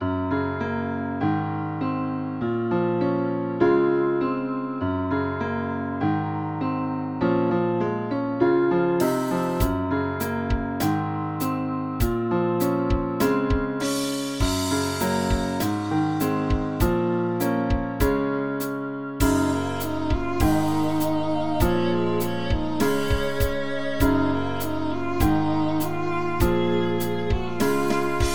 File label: Grand Piano; FluidR3